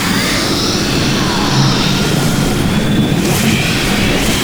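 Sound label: tryndamere bloodlust; LoL; demon sword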